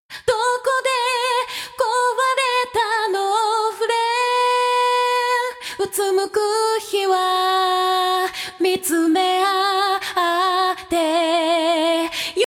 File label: vocal Off